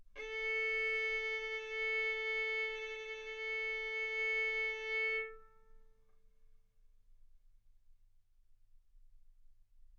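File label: Violin arco mf A string A4 B4 stereo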